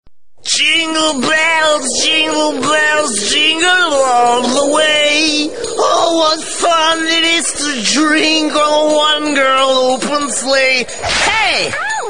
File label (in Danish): beruset julemand